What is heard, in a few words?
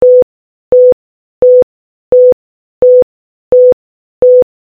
Tone